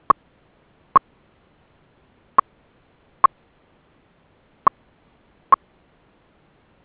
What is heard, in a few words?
Beacon Mode 0; BeaconMode2